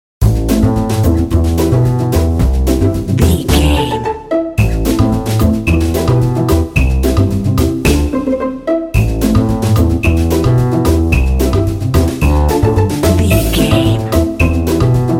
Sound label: playful jazzy dramedy